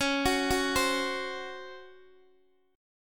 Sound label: C sharp Major 7th Suspended 4th C sharp Guitar DADGAD